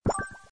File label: add money